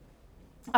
Birds

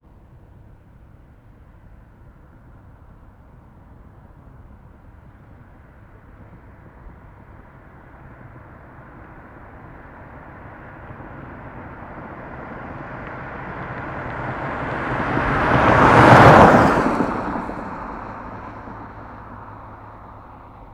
Generic Car, Pass By, Fast, Desert, Ext; Poetry Project

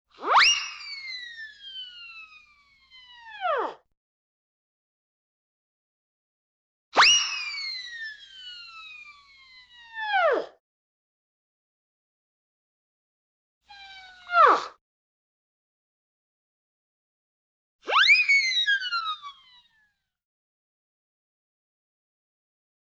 Whistle; The Look Back SFX

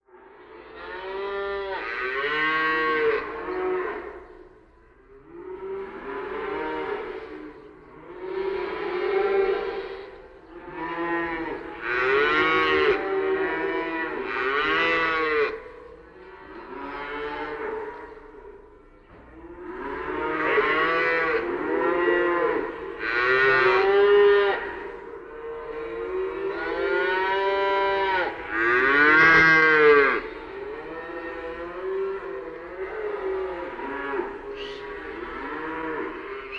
Cows Mooing, Outdoor; JERRY APPS WINTER

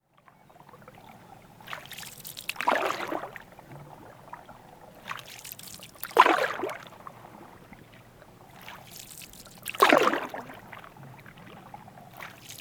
Canoe Paddle Light Paddling In Canoe; Electa Quinney

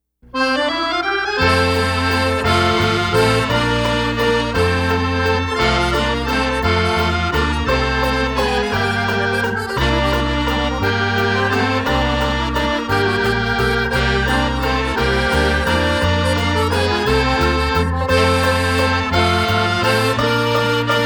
Starlight Waltz

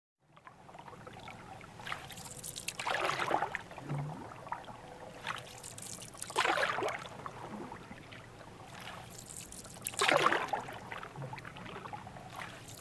Canoe Paddle Light Paddling In Canoe

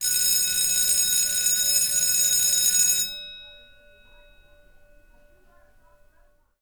Bell, School, Ring, Voices, Dist; Poetry Project

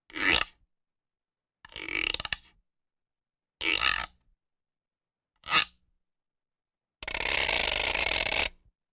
Washboard Single Rub; Electa Quinney

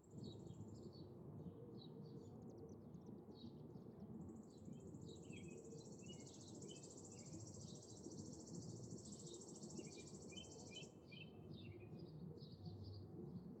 Pines and Birds; Electa Quinney

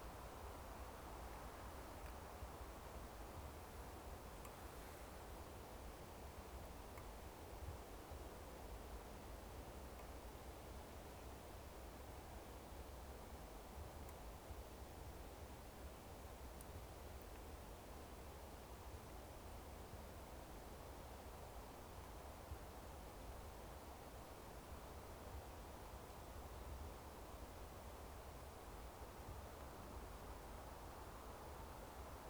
CABIN; JERRY APPS WINTER

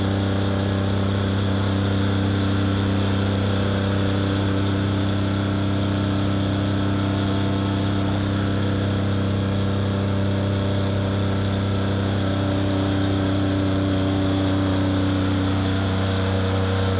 Diesel Truck Stdy Driv; Poetry Project

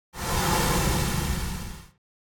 Swipes

